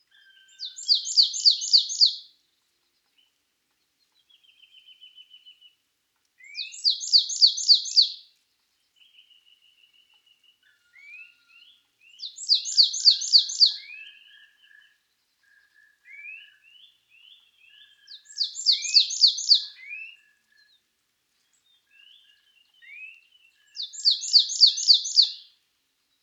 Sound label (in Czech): sykora babka Poecile palustris